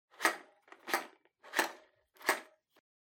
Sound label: Megaphone Hardware Battery Insert